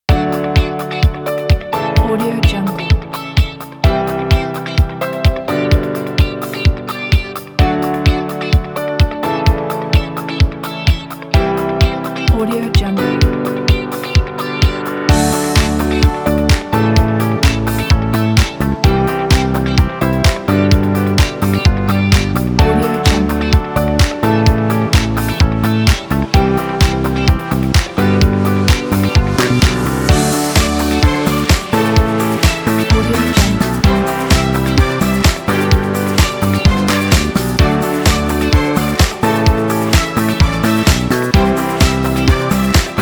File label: upbeat disco